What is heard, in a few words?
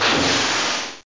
explosion; boulderdäsh; future